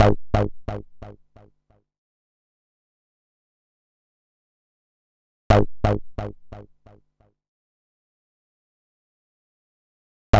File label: Growl Bass; Zero-G Smoke